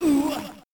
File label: knockout